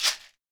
MARACAS; EdgeSounds; Drum Mashines VOL-1

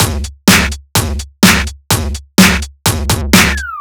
Drums, 126bpm, Nu Dance Electro; Zero-G; Soundclash; Combi Loops; Die Laughing

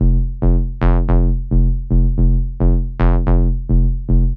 110 Fm, Melodic Loops; M8; Electronisounds

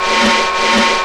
P SN, DRUMS; CMP - Analogon

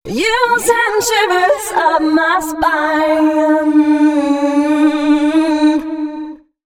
female c# 138, hooklines 136-140 BPM; Mutekki Media; Dance Ultra Voice VOL-1

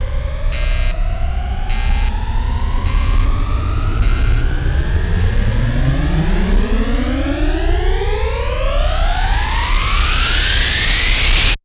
reverse; Techno Trance Essentials; FX & ATMO

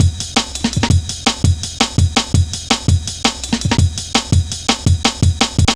jazz ridez, drum loops; Zero-G Jungle Warfare 1, 2 & 3

